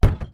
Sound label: step On Plastic